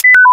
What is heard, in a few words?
sentry shutdown; snds jack gmod